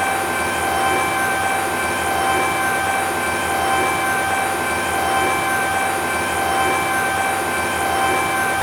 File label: tank gunner move